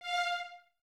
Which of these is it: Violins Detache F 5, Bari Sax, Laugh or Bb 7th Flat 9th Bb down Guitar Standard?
Violins Detache F 5